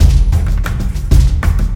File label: respin Quickspin; fairymirror